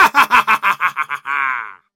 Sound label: evil laugh